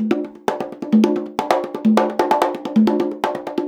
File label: 130 BONGO, Percussion Loops; USB Soundscan vol.36; AKAI